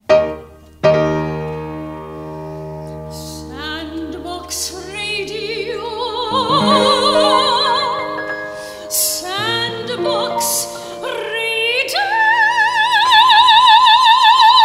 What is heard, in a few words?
Radio Jingle; Sandbox